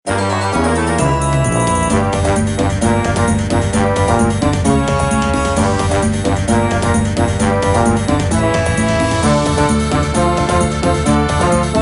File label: Nervous Tension